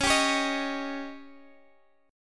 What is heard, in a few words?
Db Suspended 2nd Flat 5th, Guitar, Standard; x,16,17,18,16,x; strummed